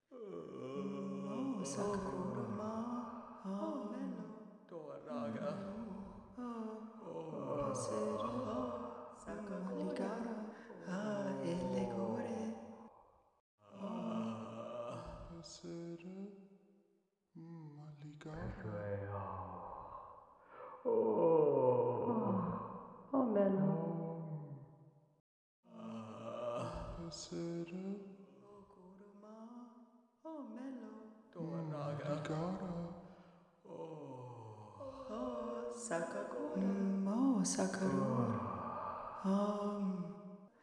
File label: pilgrim crowd distant